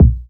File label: rich low end kick drum b key